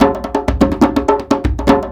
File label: Percussion Loops, Bongo & Conga; Houseworx